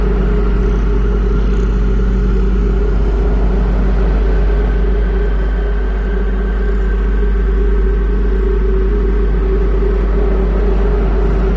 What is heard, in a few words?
Loop Wave; KriptoFX; Realistic Effects Pack v1